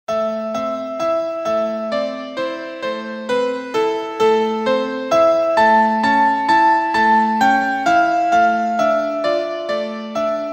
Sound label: Spanish Romance